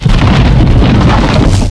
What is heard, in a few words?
concrete; gearbox; debris